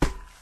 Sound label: metal, Footsteps; Barotrauma